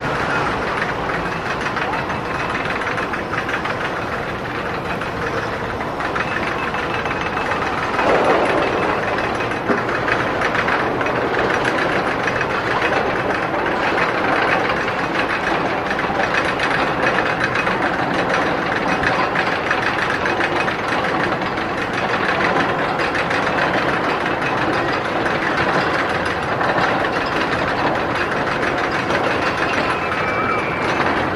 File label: Roller Coaster Running Clanks